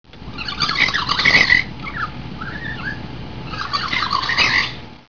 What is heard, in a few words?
cub crow